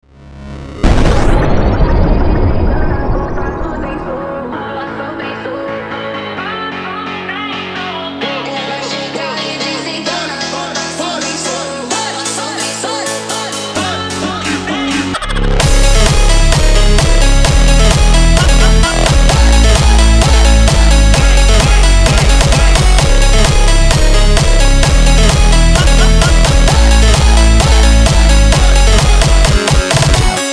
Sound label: hardstyle remix slowed